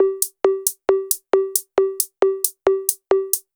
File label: Hi Hat Percussion; Session 10 - Come In Hard